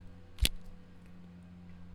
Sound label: keys flipping open